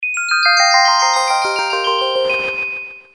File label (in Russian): Звонок; Motorola MPX200